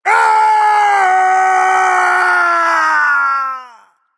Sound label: nv fear, vox; smite; voicepack; vulcan